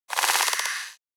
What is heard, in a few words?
Insectoid creature alien hurt